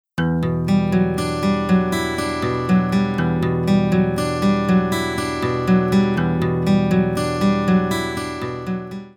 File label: chord pick; fretjam